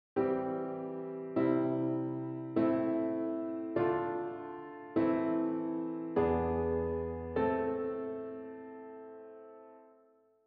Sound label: harmoniya solfecio